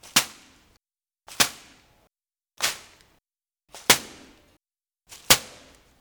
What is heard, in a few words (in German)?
Hinfallen auf Kies